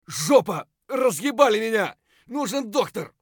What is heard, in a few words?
bear low hp; eft